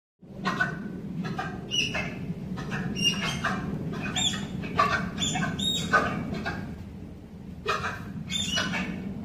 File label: Baird's-tapir, حیوان تاپیر بیرد